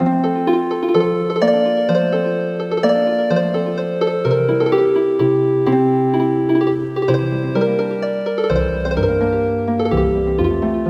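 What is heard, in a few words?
Healing Harp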